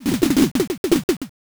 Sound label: steps crowd